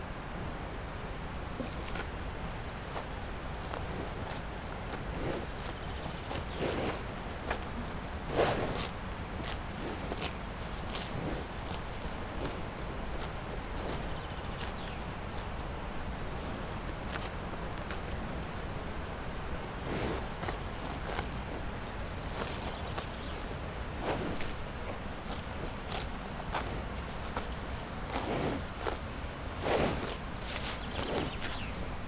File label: Saas Fee Happy Cow